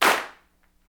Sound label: CLAPS, Africa Handclaps